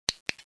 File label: image zoom